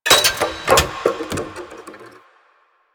Vending Machine Bottles; Warframe; Ambience; Nineteen Ninety Nine

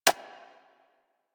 Sentient Brachiolyst Step Default, Steps; Warframe; Sentients

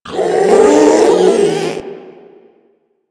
Strike Scream; Warframe; Proto Infested Ancient; Abilities

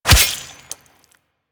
Melee Sword Impact Flesh; Warframe; Weapons; Swords

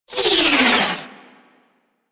Ship Dual Cannon Tail; Crp Moa Dual Cannon

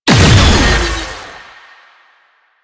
Research Pod Shield Destroyed; JadeShadows; Ascension

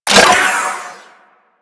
Melee Pet Projectile Attack, weapons; DE; Warframe; Sentinel; Crp Melee Pet

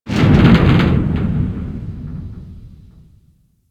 Ice Metal Rattle; Ambience; Warframe; Corpus Ice Planet

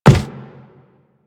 Walk Wood, Footsteps; Warframe; Operator

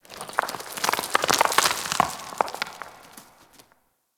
Mining Rock Debris, Mining Shaft; Warframe; Ambience; Grineer; Grn Mining Shaft

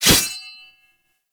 Sword Swipe, Melee Whooshes; Warframe; Weapons

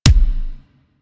Pistol Punch Alt, weapons; Warframe; Thanotech

